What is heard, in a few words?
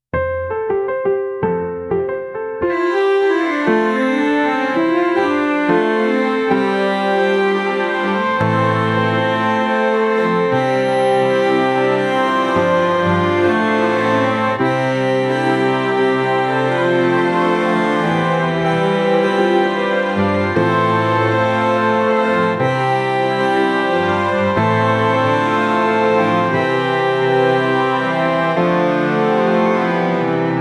music for youtube